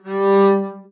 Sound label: arco g3 ff up, double bass; dsmolken